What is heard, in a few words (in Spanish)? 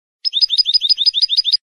bibleos simples, campero